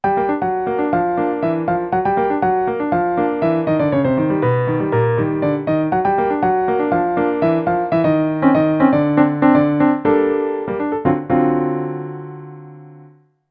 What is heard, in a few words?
LATINO RAP; MOBICLIC 57